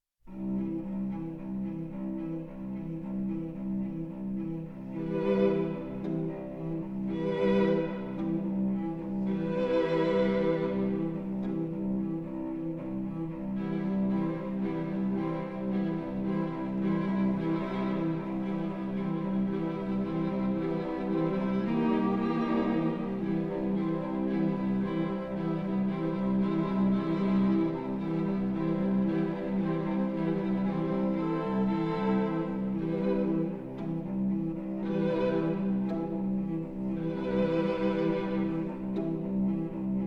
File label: string quartet; nonesuch